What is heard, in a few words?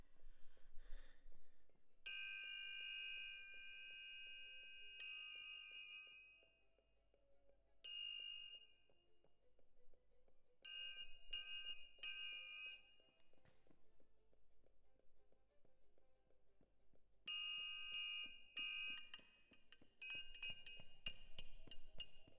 Perc Close